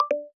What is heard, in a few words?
NFC Card Error; iOS Sounds